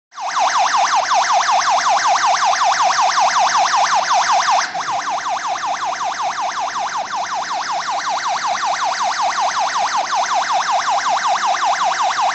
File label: Emergency